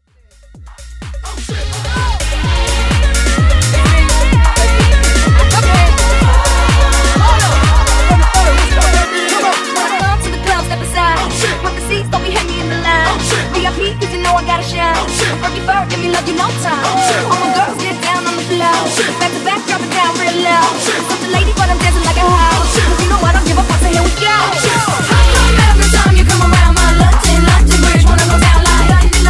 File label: Mash Up